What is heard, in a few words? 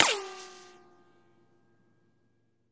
Bullet Ricochet Sin